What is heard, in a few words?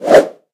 lunge, hunter attack